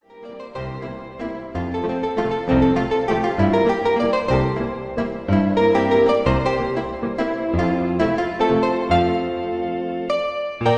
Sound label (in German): Am Kahlenberg Walzer